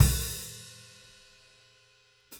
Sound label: HIT, Grooves Filz Hitz; PlatinumPhattCD1; E-MU Producer Series Vol. 8 – Platinum Phatt